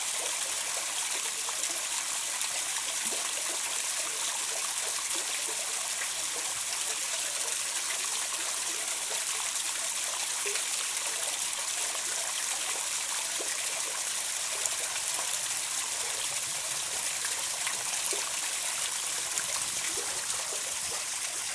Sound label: burbling grotto at osage hills